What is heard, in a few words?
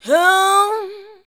UUUH, SHOUTS; Best Service; Hyper Dance